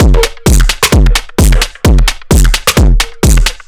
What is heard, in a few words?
Distorted Drum Beat 130, distorted drum loops 130bpm; DD Beat DOA